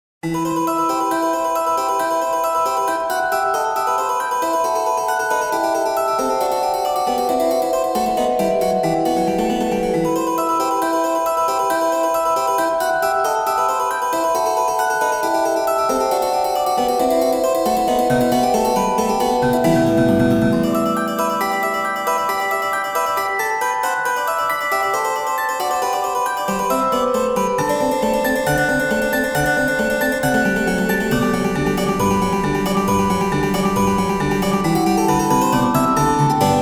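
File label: le coucou harpsichord celesta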